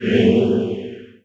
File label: lower resist; baseq2; curses